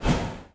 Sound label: Super Dash Air Brake; Hero